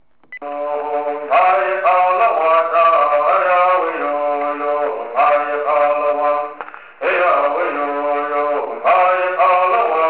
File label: Bird